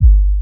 garage kick; Kicks

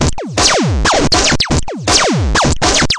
160 overloaded zapper, drum loop; 141 to 160 bpm

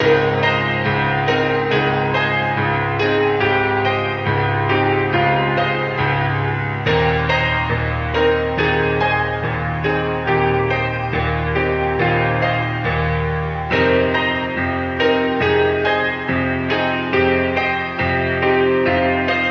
070 piano chords; synth and electronic loops; melodic loops; dear dom

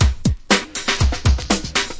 funkidrumesque, drum loops and breaks

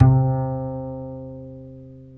upright bass c4; Upright Bass Scale

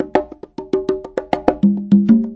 090 bongos, 090 bpm; Bazillions of Bongos

